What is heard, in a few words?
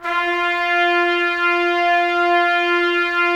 Trumpets; Roland - LA Composer vol. 4